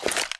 throw, flashbang; csgo

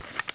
m4a1 silencer screw, weapons; csgo

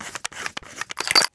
m4a1 silencer on, weapons; csgo

